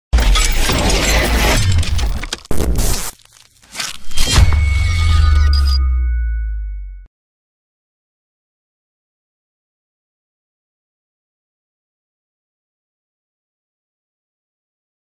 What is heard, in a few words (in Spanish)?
Himno Nacional de Alemania; DE ES Letra; Anthem of Germany